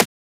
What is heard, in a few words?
Transient; FLPRO